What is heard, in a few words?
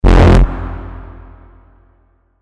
bass shot F; Waldo; revealed bass shots vol 4